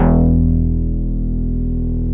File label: MINIMOOG; OLD SYNTHS; Rubber Chicken Software Sample Bank